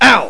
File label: OW; Games.Carmageddon